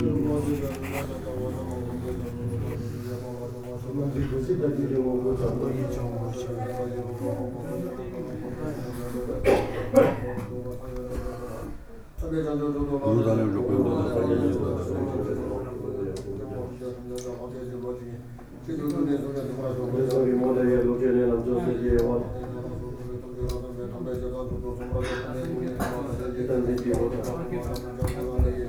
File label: chanting